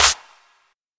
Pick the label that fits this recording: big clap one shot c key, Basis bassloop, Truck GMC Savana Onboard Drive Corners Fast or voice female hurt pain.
big clap one shot c key